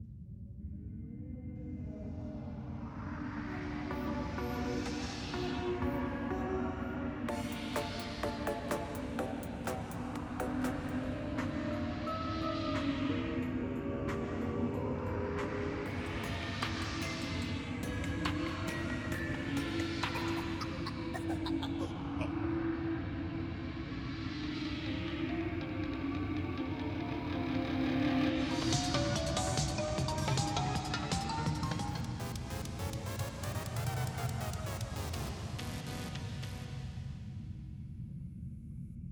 Ambience 140bpm; Gingerbread 96beats

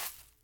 Colision Feuille little; Puddle Plus

